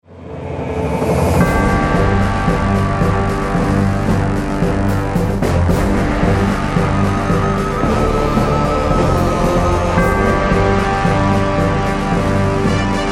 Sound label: OPENING Fanfara